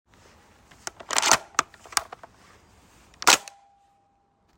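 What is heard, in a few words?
Bren mk1, Deactivated Machine Guns; Lockstock Deacts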